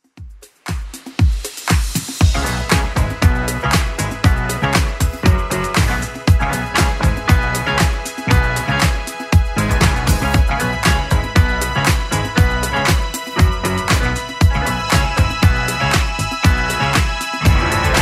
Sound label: 80s Club Edit 118bpm